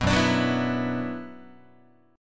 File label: F sharp 7th Suspended 4th Sharp 5th, Guitar; F#7sus4#5 strummed